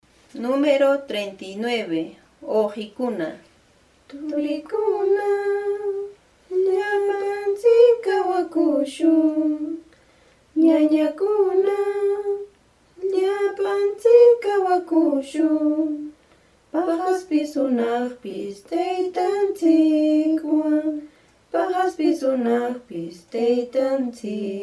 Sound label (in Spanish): cancion